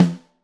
Snare Top

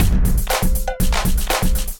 Kindified Kit, Drums; Kindified Kits

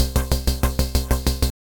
Synth Rhythmic; Synth Essentials; Wavetable; Tortoise and Trent

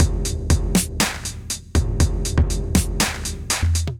Wanderlust Kit, Drums Hybrid; Ableton; Factory Packs; Drum Essentials

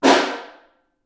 Far, Snare

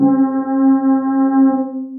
SoundScape Generator, Synth Pad; Instruments; Ableton; Synth Essentials; Tension

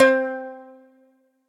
MPE Cigar Box - Strum (Slide); Instruments; Plucked Items; Ableton; Factory Packs; Lost and Found

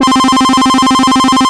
Videogamer, Effects

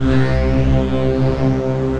Dread Distort, soaring leads; Ableton; Mood Reel

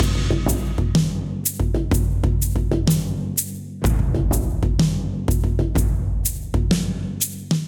Mammoth Kit - 125 bpm, drums; MIDI Clips; Ableton; Factory Packs; Mood Reel

